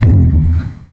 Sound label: land; coolmathgames; 60 second santa run